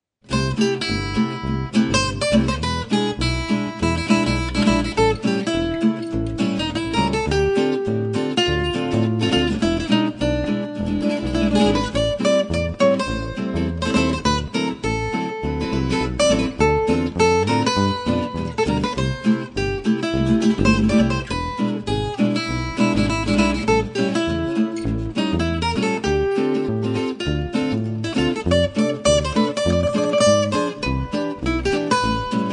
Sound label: Hot Club swing, Acoustic Guitar